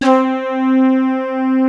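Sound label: FLUTISH BRASS; Greytsounds; Scott Peers Sound Engineering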